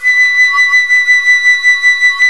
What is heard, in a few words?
FLUTE, Flt vib; C Flutes 1&2; Roland L-CDX-03 Disk 1